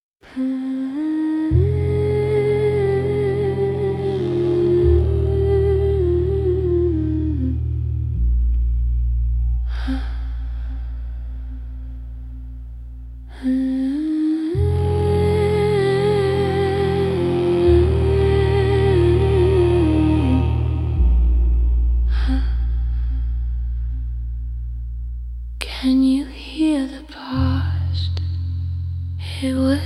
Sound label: breath